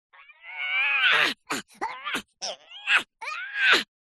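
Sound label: cartoon fight sound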